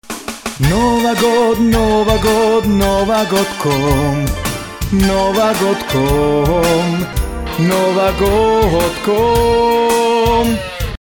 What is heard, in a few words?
jingle bells